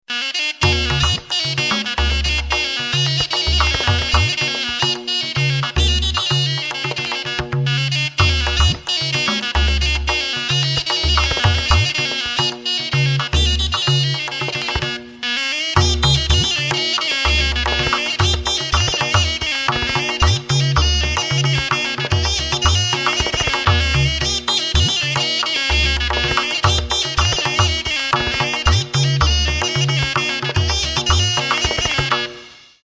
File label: Zhaleyka